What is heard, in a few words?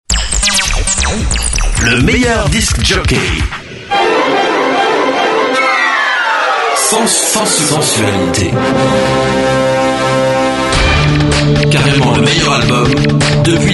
jingles